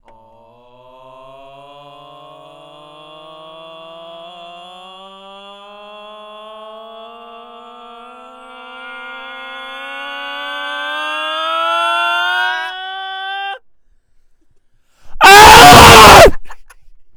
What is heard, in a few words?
OOoooOOOoohhhhhHHhhh